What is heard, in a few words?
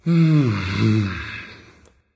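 nonverbal content; smite; voicepack; ullr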